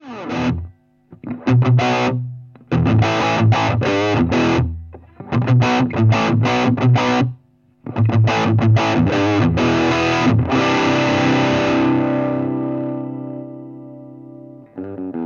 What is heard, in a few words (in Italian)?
higain chitarra